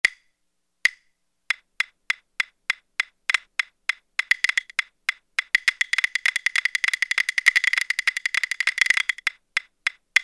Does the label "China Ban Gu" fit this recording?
Yes